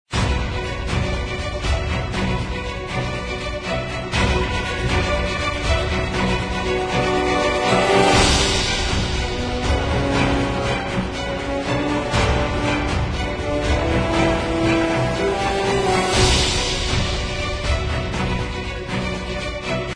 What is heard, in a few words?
Music; Brave Fighter 2 Monster Legion; Android